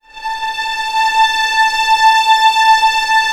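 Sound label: strings A4 PP L; Sound On Sound - Peter Siedleczek's Orchestra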